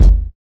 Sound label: reverb steel kick drum sample d key; Duration 0:01